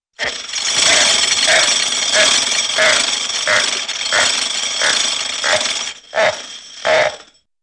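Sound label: Rapid Metallic Clicks with rhythmic Circular Creaks, cartoon; Hollywood Edge; Cartoon Trax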